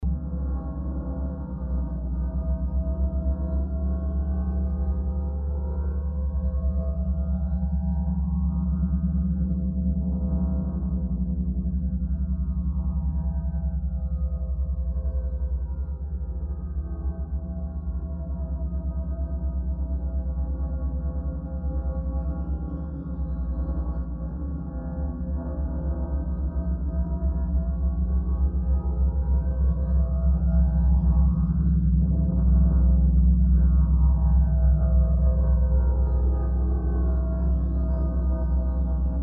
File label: electric gamelan PHASE TRAIN